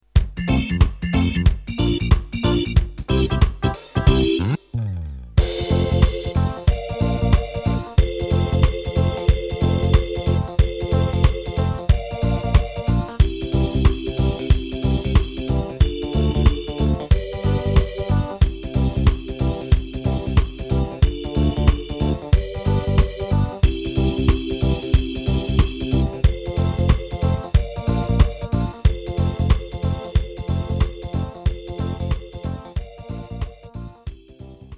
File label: YAHAMA PSR S700 Reggae version